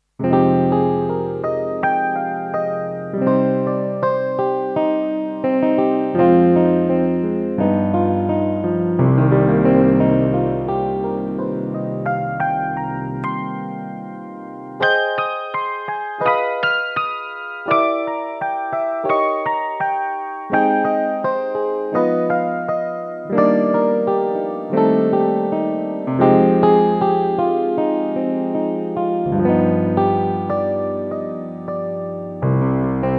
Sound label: DX7IIFD Rich Grand Piano